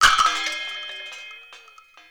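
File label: FLEXI NOISE; PlatinumPhattCD2; E-MU Producer Series Vol. 8 – Platinum Phatt; Flexis Etc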